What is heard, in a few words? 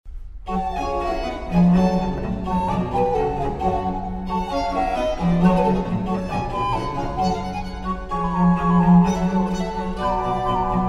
Trio g-moll Vivace; Vivaldi